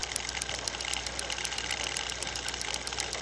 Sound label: Switch Move; MiSide